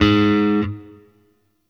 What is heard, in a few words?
Sentient Shawzin Oct Two G Sharp; Warframe